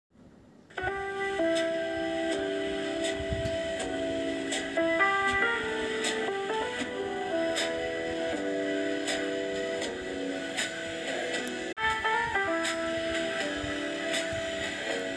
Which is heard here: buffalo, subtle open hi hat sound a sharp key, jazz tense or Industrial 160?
jazz tense